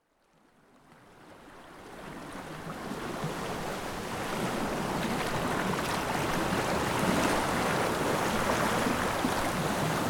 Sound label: soft focus ocean